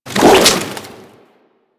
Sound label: Kraken Tentacle Whoosh; Warframe; Warframes; Pirate